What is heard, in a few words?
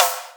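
radio snare, Boss DR660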